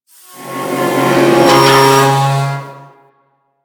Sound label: Pipe Moan, Ocean; Ambience; Warframe; Grineer Ocean